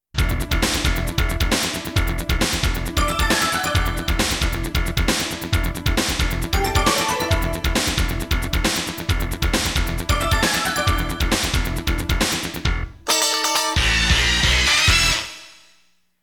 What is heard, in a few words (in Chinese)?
激情的背景音乐